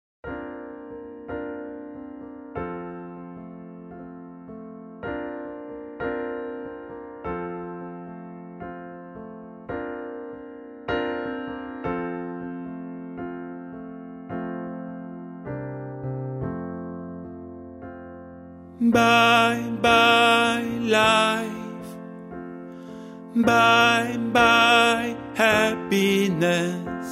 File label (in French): TUTTI